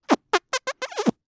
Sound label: cartoon kiss suck